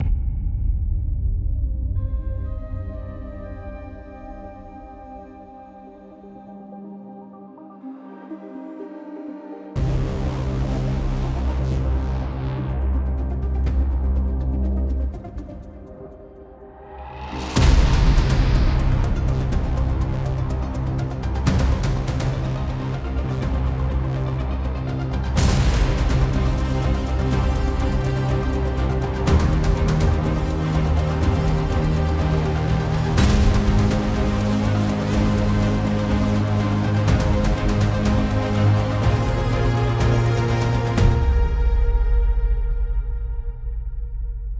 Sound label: leads